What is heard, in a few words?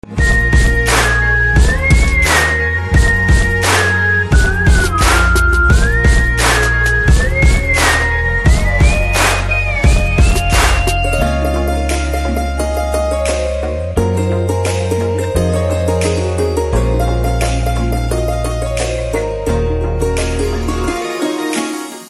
BGM Ringtone